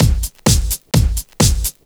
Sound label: Straight Loop 128 BPM, House